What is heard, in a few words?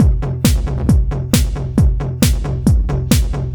Drums Techno